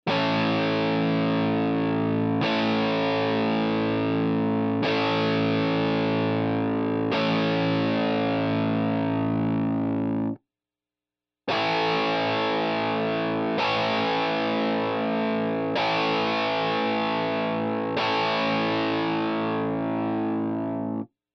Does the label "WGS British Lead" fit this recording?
Yes